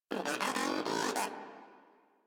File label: Moa Aggressive Vocal Studious; Warframe